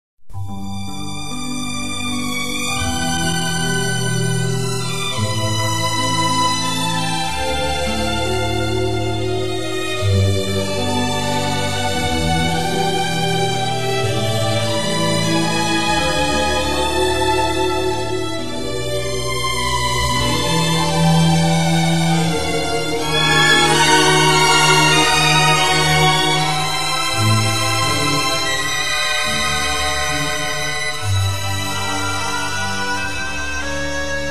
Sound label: Orch